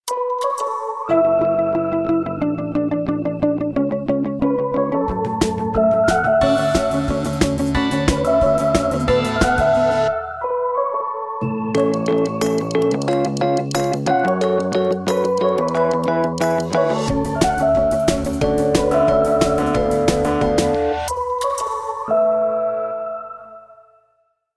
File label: Musique Ringtone; SFR